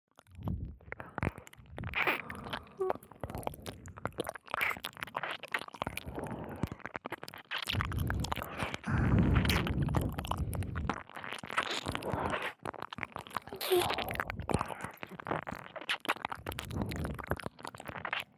耳舐めループ; 反転 11 耳舐めループ

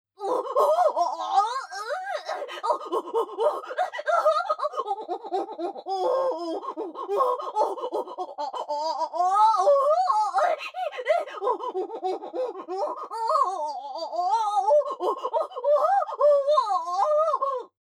ふたなりバック 喘ぎ 加速; アスティリア

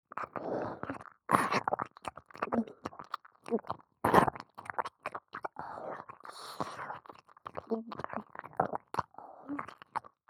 耳舐め; アスティリア

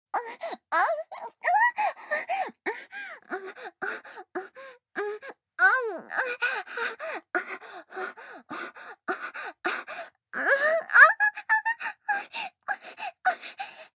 授乳騎乗位喘ぎ声, ASMR

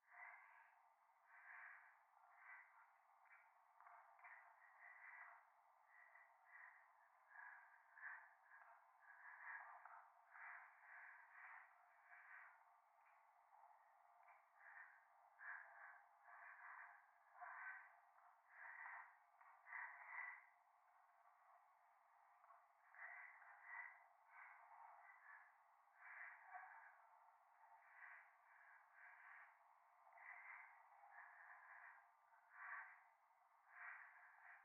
右耳 快感 吐息 電車; 夢の中

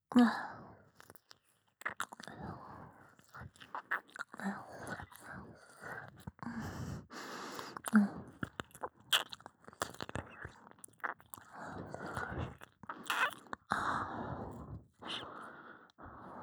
耳舐め・普通

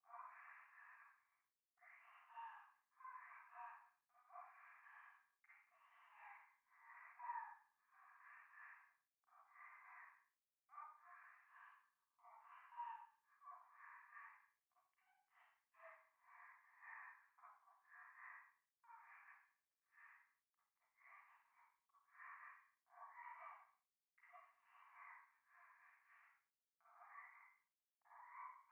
右耳 密着 処女 喘ぎ; 夢の中

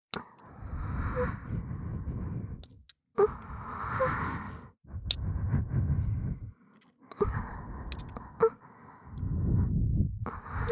(両耳)おまけの耳元囁き喘ぎエッチ, 黒耳 くちゅ系効果音版

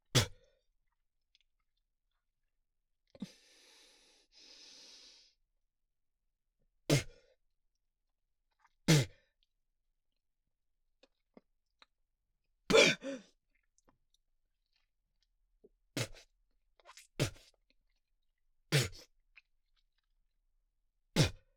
唾 吐き

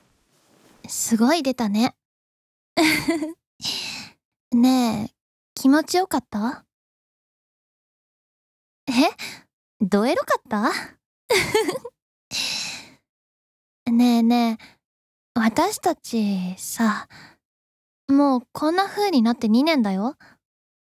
キス; ドラマ; その寂しさ、埋めてあげるね

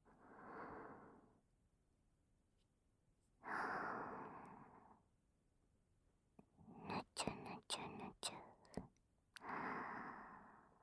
W濡れ耳かき（クリーム指耳かき）, ASMR

